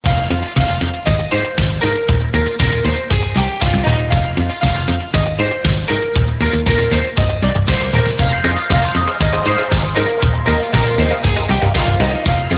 ringtone disco, instrumental

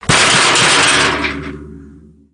metall door bang

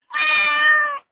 cat

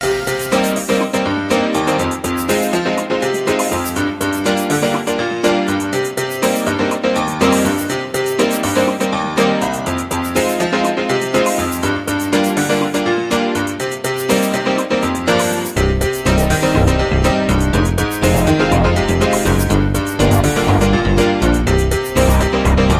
ringtone new age fantasy

